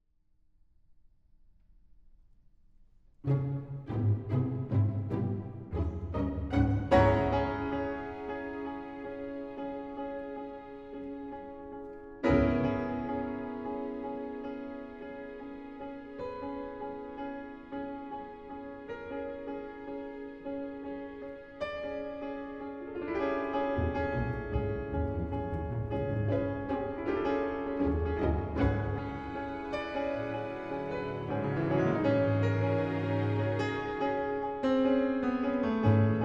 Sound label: Piano Concerto